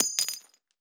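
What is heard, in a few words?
bullet case bounce 50bmg dirt; gmod; viper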